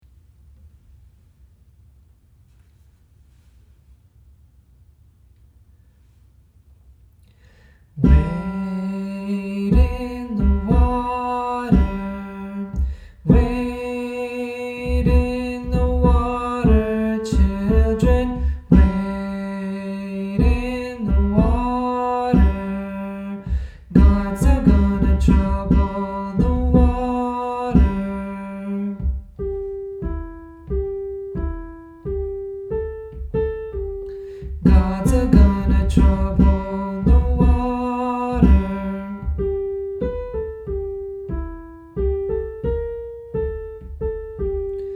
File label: tenor